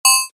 Navigate: Short SFX For Navigating Between Options; Multimedia; Buttons; Stuart Duffield